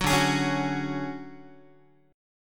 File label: D Major Sharp 11th D down Guitar, DM#11 chord; strummed; JGuitar